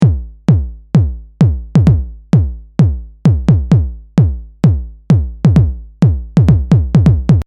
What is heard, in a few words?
techno kick